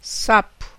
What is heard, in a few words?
toad; animais